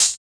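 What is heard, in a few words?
GRITTY closed hi-hat; Drums In Your FACE!; BONUS HATS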